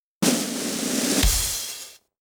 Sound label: Roll a Drum (Results Announcement Jingle); NDS; English Training software